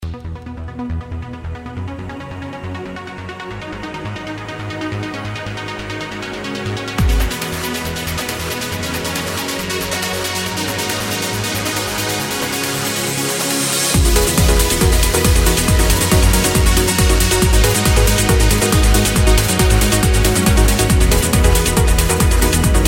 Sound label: Uplifting; Croatia 2014